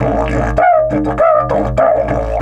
DIDGE 100BPM; Big Fish Audio - Didgeridoo and Other Primitive Instruments

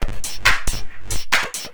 percussion loops, 138, Techno

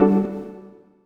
Synth Chord, Drum N Bass; Sonic Foundry (Sony Creative Software); Knutrix Drum & Bass

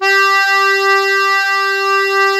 DIATO2 G2, Historical Instruments; USB Soundscan vol.23

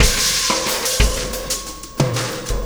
Oscillation Beat

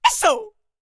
YELP, World Voices, ANDES FX; Spectrasonics Vocal Planet CD5

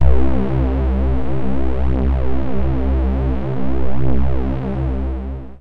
Single Electric Basses, Dance Floor Bass

